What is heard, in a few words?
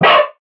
wolf hurt